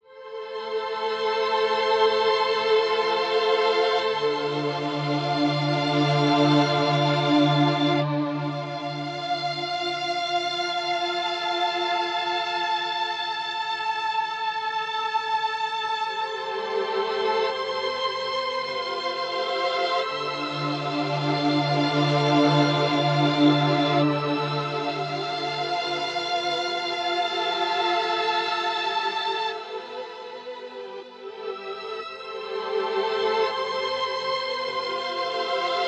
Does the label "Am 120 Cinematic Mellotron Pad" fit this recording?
Yes